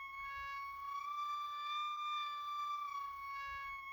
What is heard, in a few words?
House fuse loop; Phasmophobia